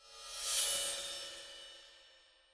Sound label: bonus Game Symbol To Panel Anim; netent; secretofthestones